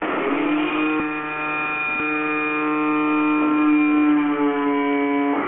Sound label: Gear-up, Aircraft